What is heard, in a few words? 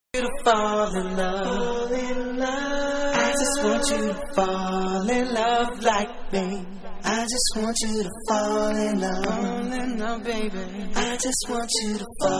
Acapella